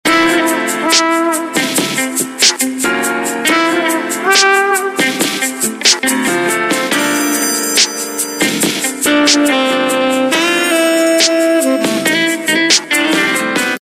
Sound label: Ringtone, Samsung GT-B3313 Corby Mate